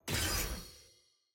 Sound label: prestige click